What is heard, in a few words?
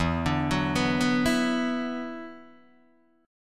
E 7th Suspended 2nd, down, Guitar, Open D